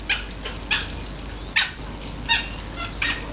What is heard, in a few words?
Aix sponsa; Wood duck; Series of loud barking calls